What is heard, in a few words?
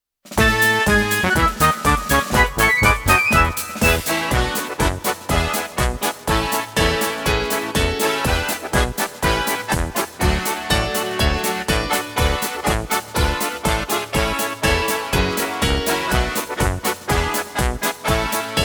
instrumental